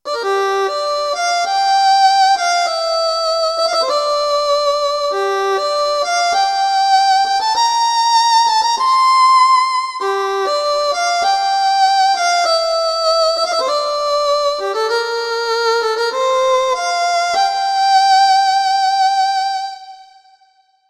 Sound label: violin